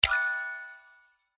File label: BONUS PICK; GangsterAlley